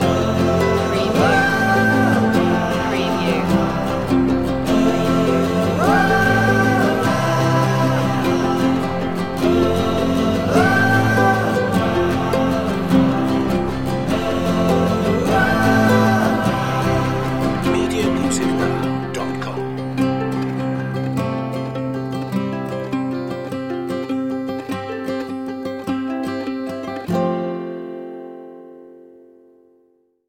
the nu-folk tune

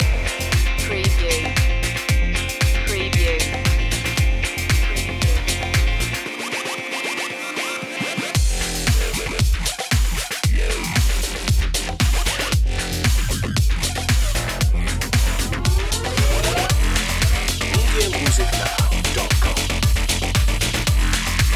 disco stepping

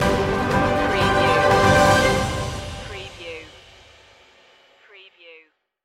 last countdown stinger

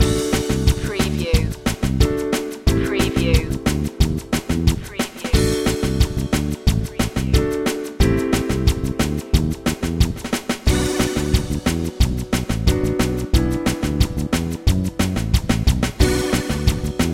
retro video game bubbles loop

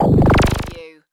Hi-tech Panel Servo Button

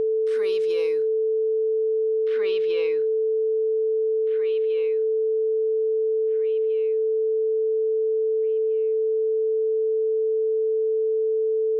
Test Tone 440Hz

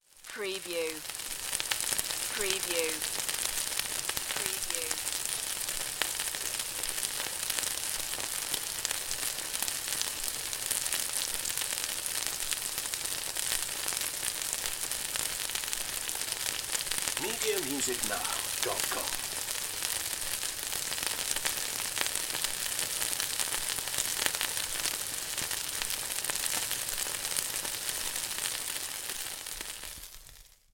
Frying

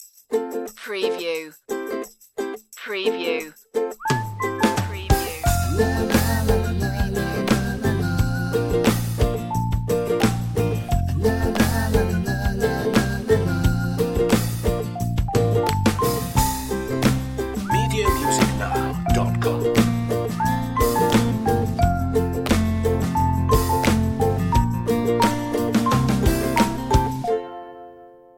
ukulele commercial

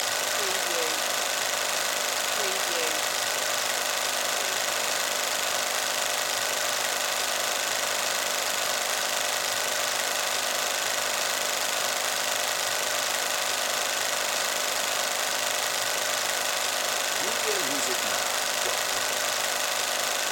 Film Projector Run; sound-effects-bible

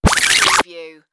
Turntable Scratching Reverse

